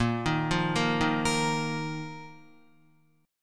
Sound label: Bb Suspended 4th Bb down Guitar; Bbsus4 arpeggiated